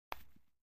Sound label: Golf Putter Putts Ba